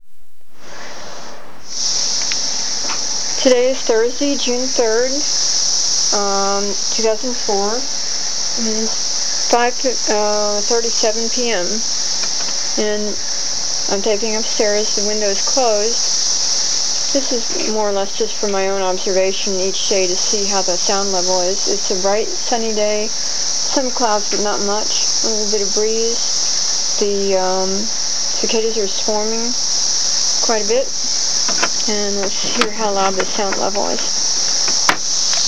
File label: cicadas; Friday, June 4, 2004, recorded from indoors at treetop level: Still fairly loud.